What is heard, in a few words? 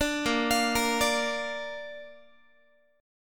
Bb Major Bb down Guitar, chord; arpeggiated